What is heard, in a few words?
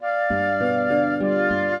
trio, flute harp; Mozart